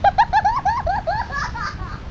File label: laugh